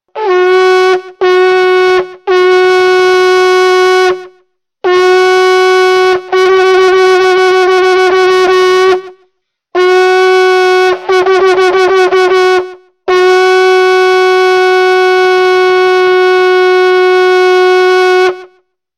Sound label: Shofar